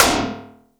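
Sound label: plastic snare; 90 sSampleCDs; Crypton - DrumBase5000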